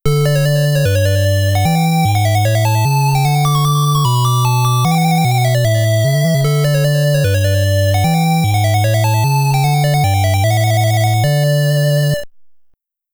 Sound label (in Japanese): ファンファーレ; 魔城伝説II 大魔司教ガリウス; Konami Antiques Family Computer